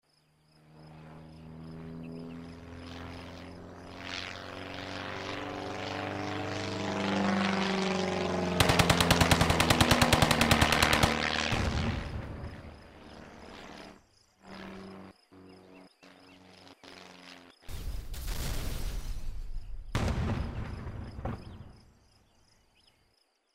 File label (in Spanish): avion ametralleado